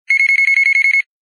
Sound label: Alarm Clock